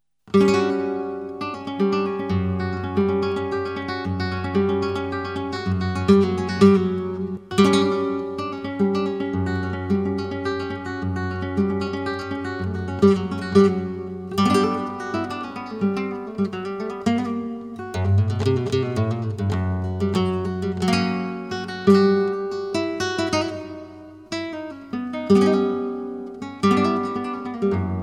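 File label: soleares, flamenco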